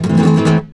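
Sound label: rasgueado A# minor, guitars, flamenco; Spectrasonics; Hans Zimmer Guitars VOL-2; FLAMENCO FX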